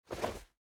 Dodge; SandBox Musics

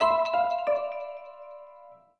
Event Life Loss; SandBox Musics

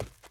Sprint; SandBox Musics

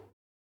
Attack; SandBox Musics

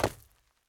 Land; SandBox Musics

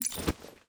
Generic Equip; SandBox Musics

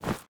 Step Run Snow; SandBox Musics

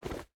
Jump; SandBox Musics

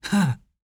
Male Land; SandBox Musics

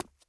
Run; SandBox Musics

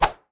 Sword Swish; SandBox Musics

